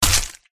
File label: skolopendra attack contact up